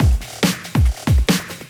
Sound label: Loops BB 140, BreakBeats; Vengeance Samples